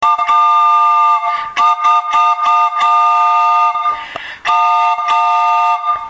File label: boat